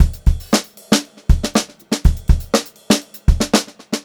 FUNKY, Funk Groove Drumloops; USB Soundscan vol.38; AKAI; 90 s Sample CDs

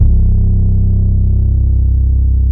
C BASS; Zero-G; Chemical Beats; BASSES

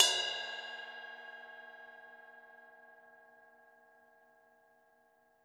RIDE, Drums Percussion

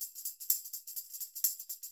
TAMB, Percussionworks; Sampleheads; NYC Percussionworks

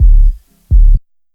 DRUMLOOP, Ragga & Dub Reggae; USB Soundscan vol.27; DRUMLOOPS

